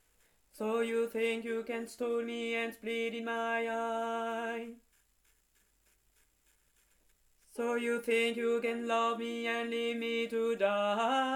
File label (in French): bassi